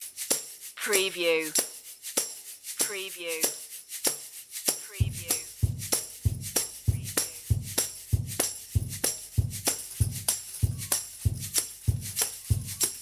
blues loop

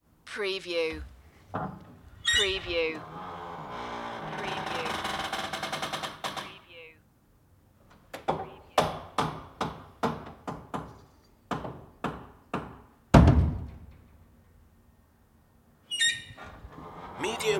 creaky hallway door

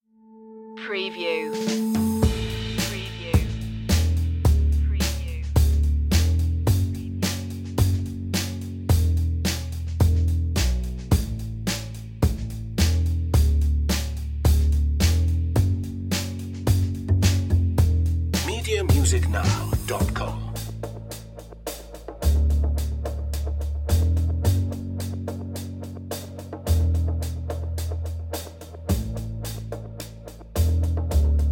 romance drums and bass

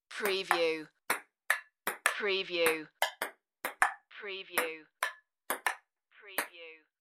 Ping Pong

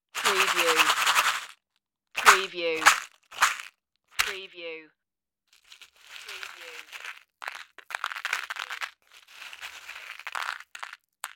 box with metal pieces

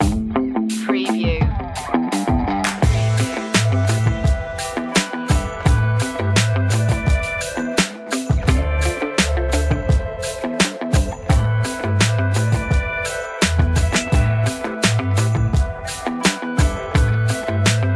urban hip hop

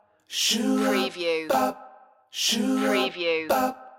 sunny day loop

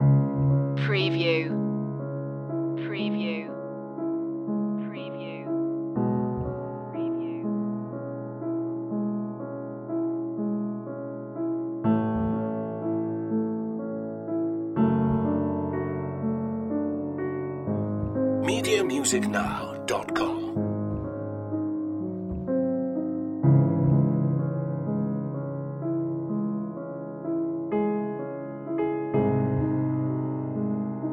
moonlight sonata with pedal noises; beethoven

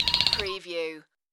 Tui Flutter

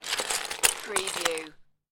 Lego, rattling lego pieces

